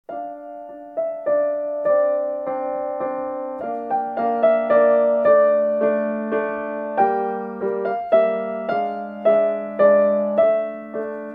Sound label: Russian winter; Piano Time